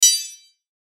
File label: chime metallic flange high; Chimes; Blastwave